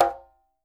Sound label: quinto; Sampleheads; NYC Percussionworks